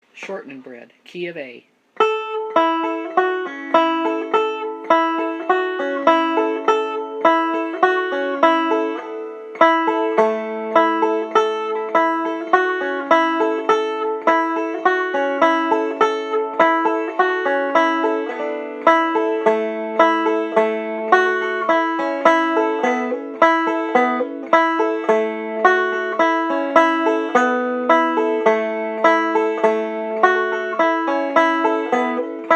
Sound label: Key of A Banjo slow and faster; Shortnin Bread